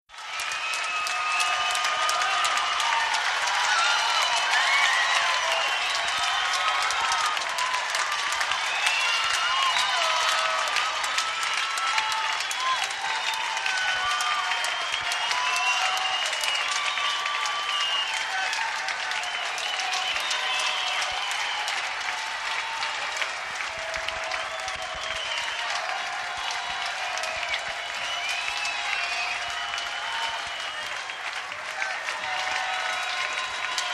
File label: Rock Concert Cheers